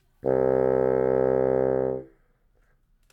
Bassoon C 2 Tenuto Non Vibrato

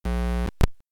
Buzz

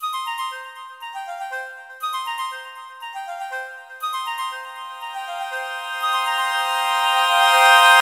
Orient flute

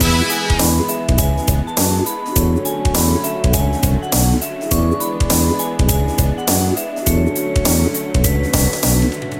Platform Game Theme Loop

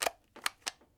Whisk Reload

